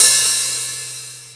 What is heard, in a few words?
perc open HH; Hexen Music; Hexen 6vox